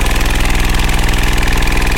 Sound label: generator diesel small; AA Dangerous Meltdown - EK Compatible